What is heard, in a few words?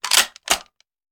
Rifle Foley; Sound Ideas; Guns